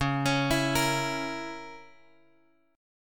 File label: C sharp Minor 6th C sharp, guitar; C#m6 Chord {X 4 X 6 5 6} arpeggiated